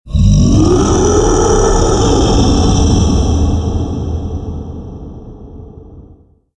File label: danger growl ambient